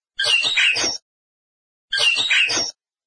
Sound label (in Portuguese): canto bicudo